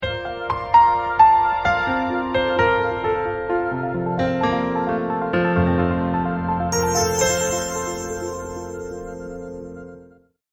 corp logo, is a classic cinema sounding accompaniment.